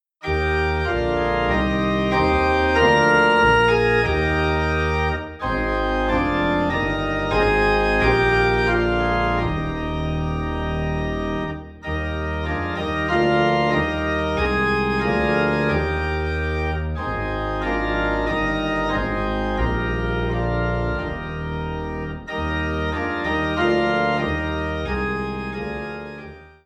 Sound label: Organ Low in E Flat; For The Beauty Of The Earth